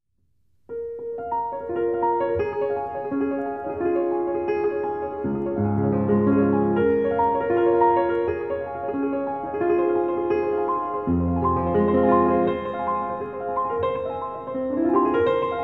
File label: rustle of spring, pianist; sinding